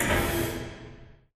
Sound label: radar tower servo; Titanfall 2